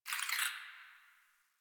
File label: Tree Critters Happy Calm; Warframe; Duviri